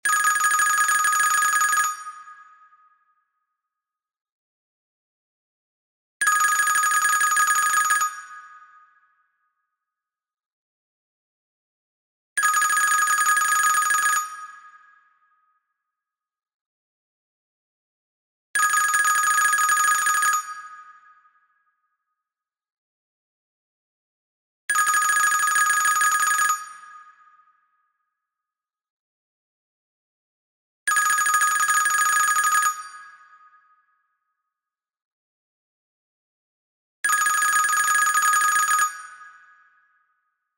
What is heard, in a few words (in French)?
Telephone chinois